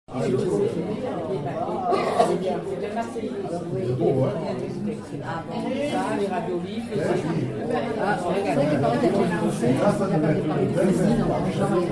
brouhaha

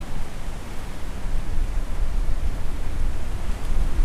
vent dans les feuilles

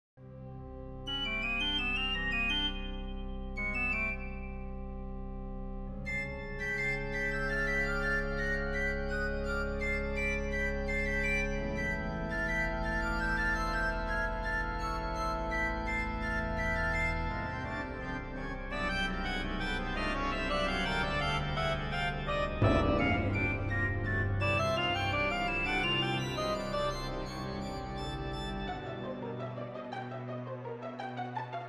essai org. bas clar.

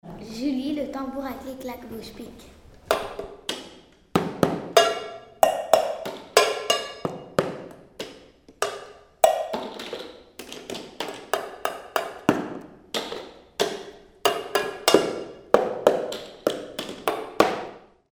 tambour a clic clac bouche

